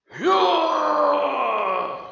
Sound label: Battlecry